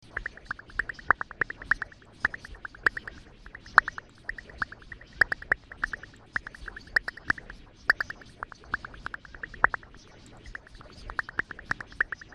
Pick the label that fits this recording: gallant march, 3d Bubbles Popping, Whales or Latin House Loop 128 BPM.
3d Bubbles Popping